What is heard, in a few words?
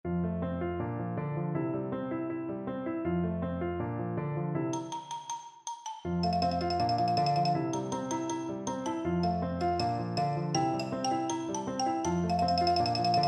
la bamba, percussion